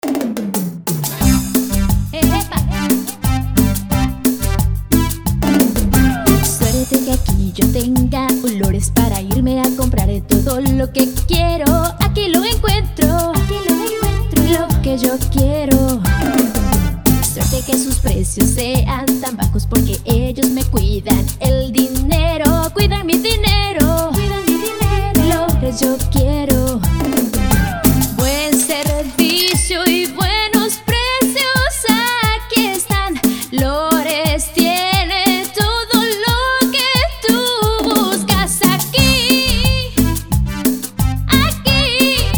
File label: JINGLE